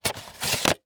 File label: Technology Electronic VHS Tape Replace In Sleeve